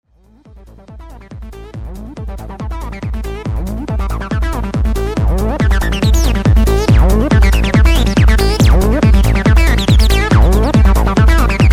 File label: Access Virus C 303; TB: Virus-C